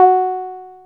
ACID F#4; Best Service Alpha Dance vol.2

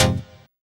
HOUSE, House Dance; 90 s Sample CDs; Best Service Dance Mega

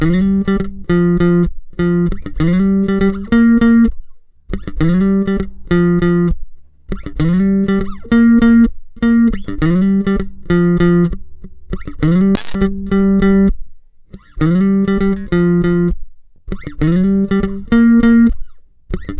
COOL RK 100, Bass Grooves; USB Soundscan vol.30; AKAI

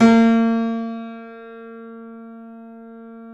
C7 F, The Ultimate Piano Collection; East West

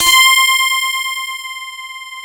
DS8 C 6, Korg; Back In Time Records; Korg Universe VOL-1

